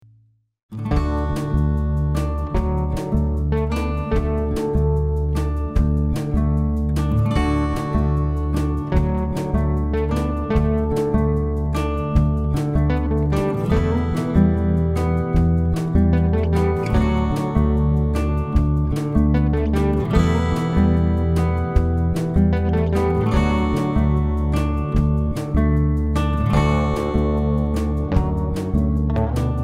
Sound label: western